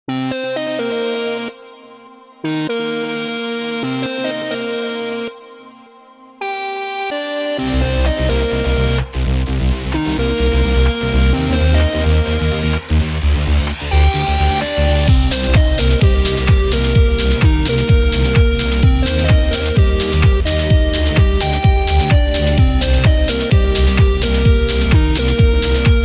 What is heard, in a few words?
Trance